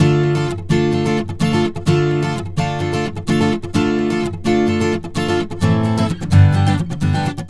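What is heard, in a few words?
Acoustic Latin Guitar 128BPM D minor; Acoustic Latin Guitars; Vengeance; Essential Clubsounds Vol.4; Guitarloops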